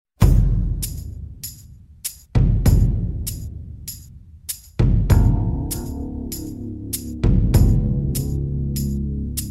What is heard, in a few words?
Native American Drum